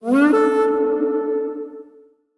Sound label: ultrafast bigwin; DinoReels81WD; wazdan